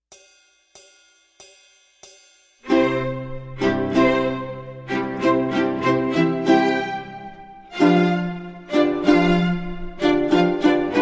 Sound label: Eine Kleine Ukulele Music; Classical Ukuleles A Collection of Favorites for Young Players